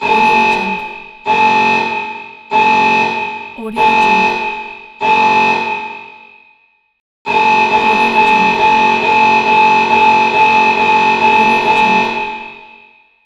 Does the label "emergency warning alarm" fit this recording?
Yes